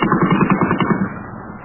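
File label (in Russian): боевые действия на расстоянии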